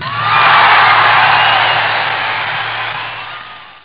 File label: spectators; Amiga Soundtracker sample